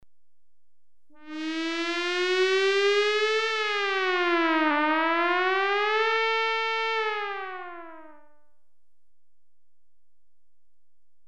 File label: 4 minute warning alert (2 cycles, higher pitch), Electronically Generated Sounds; Sound Effects Library